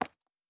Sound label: Footstep Concrete Running